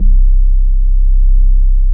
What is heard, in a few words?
HOLLOW ORG C; Greytsounds; Scott Peers Sound Engineering